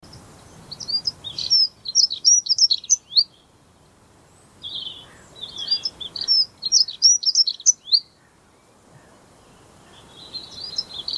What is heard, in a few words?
Accentors; صعوه